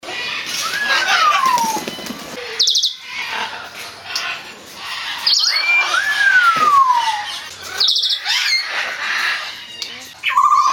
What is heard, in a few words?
African Grey Parrot, parrot calls